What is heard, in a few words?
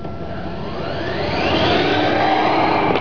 drive by